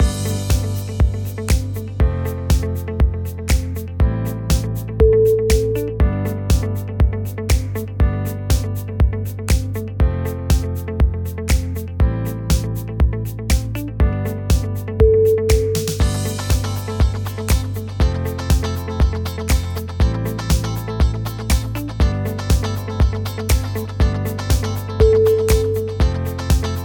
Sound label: happy funky summer